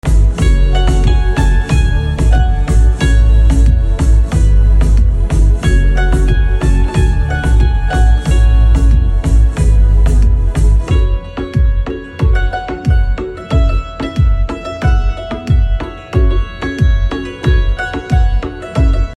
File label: cimbaly instrumental cover live music